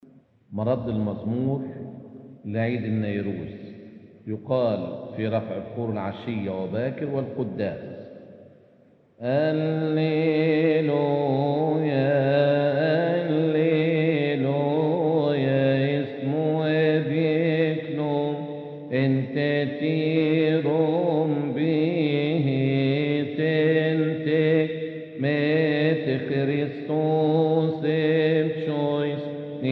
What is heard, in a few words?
psalm response